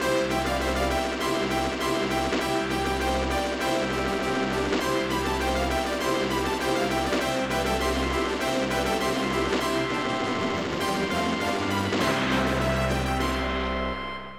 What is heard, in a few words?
FANFARE; Gold Medal Software - Volume 3 (Gold Medal) (1994); music